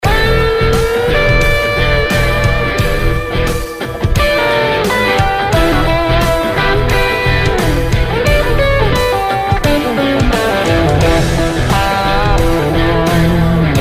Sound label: Bluesman